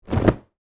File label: Body Fall Mat, Gymnastics, Hard; Sports; Miscellaneous Sport Sound Effects; Blast Wave